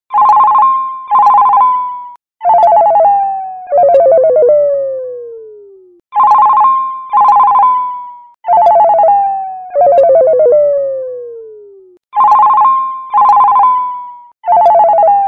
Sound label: crank ringtone